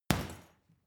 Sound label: boxing punching bag hit medium; sport-freizeit; boxen